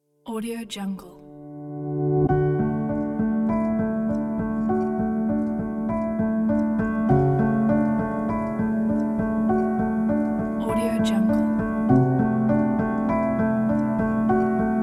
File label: one summer day; ulysses65